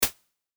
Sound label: Hi Hat